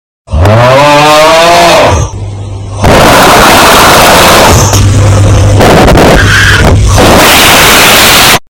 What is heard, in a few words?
aughhhh